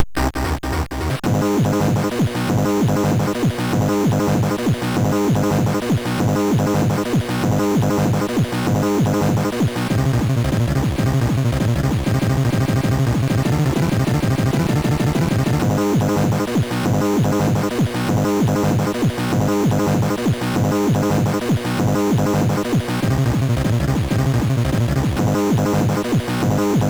Techno, MOS6581; sid; MOS6581R4

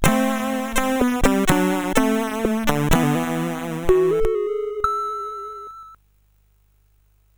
sid CSG8580R5

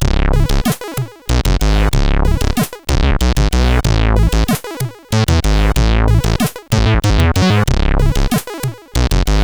sid CSG8580R5

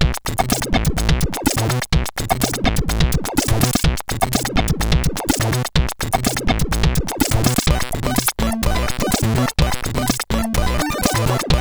sid CSG8580R5

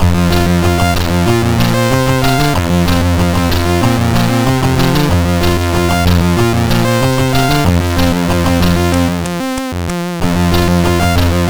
Beat Box MOS6581R4; sid

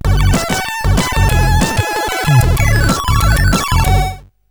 sid CSG8580R5

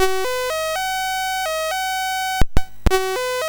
Traffic Jam BASIC, MOS6581R4

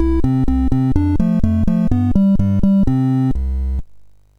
Croaky; MOS6581R2

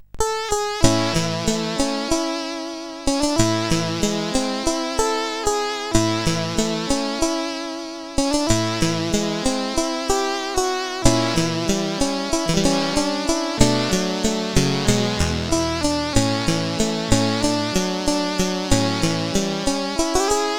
Lullaby CSG8580R5; sid

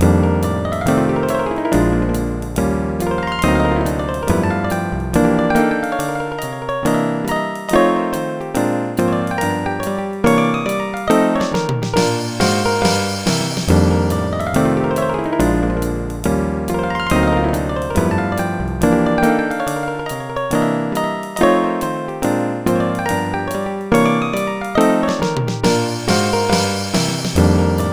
solo; Dr. T's MIDI Recording Studio

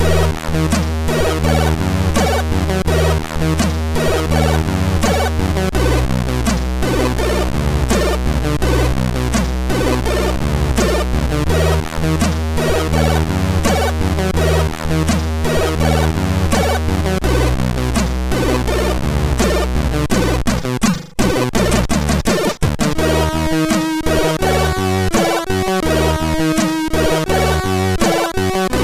Intro Tune One, MOS6581R3

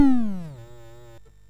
MOS6581R2, sid; Defenders of the Earth

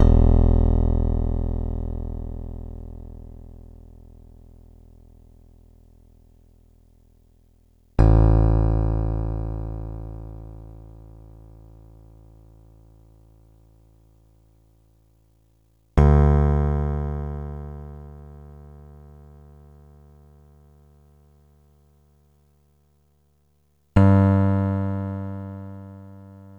tune your bass; Master Tracks Pro

